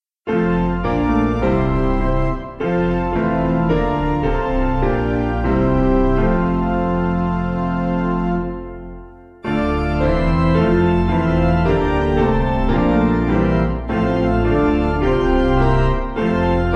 Piano, Free Music Backing Files for Churches - Piano, Band, Pipe Organ; Caneuon Ffydd; Llwyncoedwr